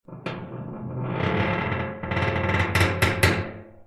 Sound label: airlock alien prying